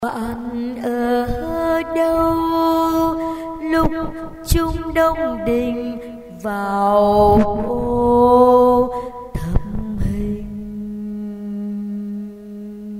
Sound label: basso